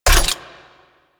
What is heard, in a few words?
Rocket Launcher Fully Charged; Warframe; UT Weapons